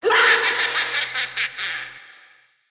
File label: ghost